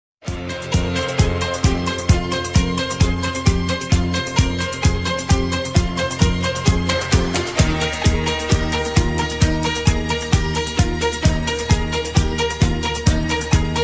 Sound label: Russian Meme Song